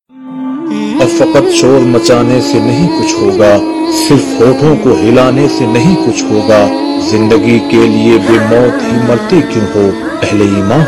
Islamic Ringtone Emotional; Ertugrul Ghazi

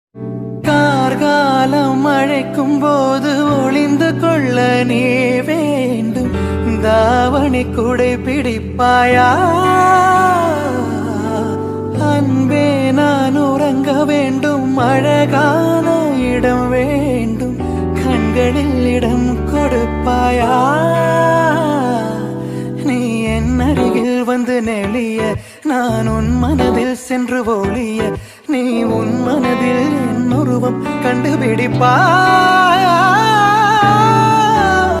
Rhythm

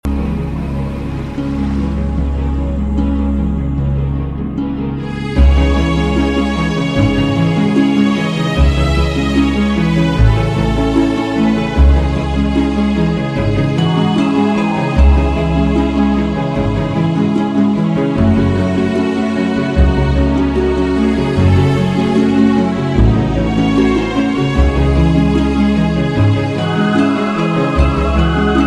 Extreme Violin

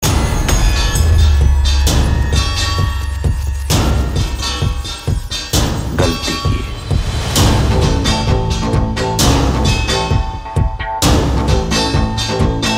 BGM; Mirzapur 2

